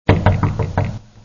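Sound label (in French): battement toc toc porte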